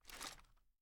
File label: inspect; gmod; viper; weapons